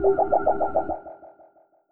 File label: Green Wave